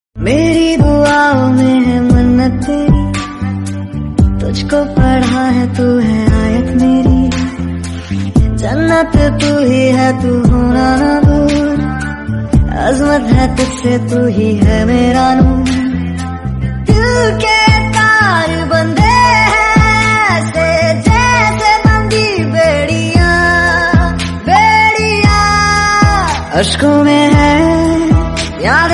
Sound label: soft song tone ringtone